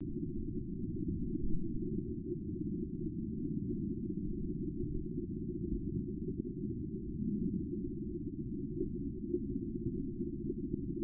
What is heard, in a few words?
Hydrophone Filtered